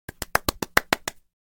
clap; clapping

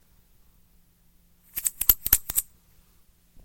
shake

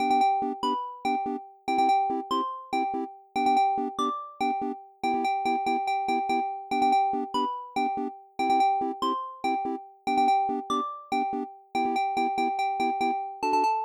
family computer

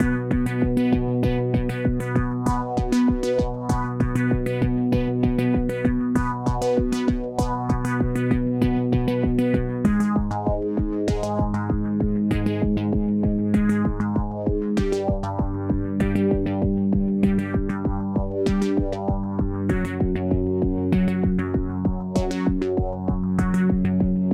neonbattle, instruments

